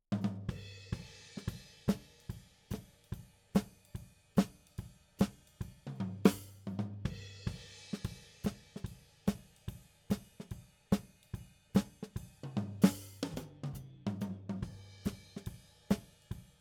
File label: SNR top